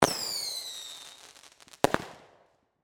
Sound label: Firework Whistles, Cracks, Pops; Fireworks